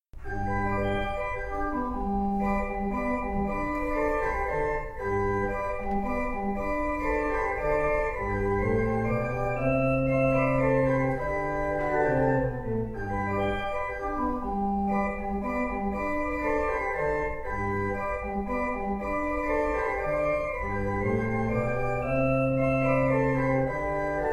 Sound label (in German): Flötenuhr Menuett G Dur; gekürzt; C Ph E Bach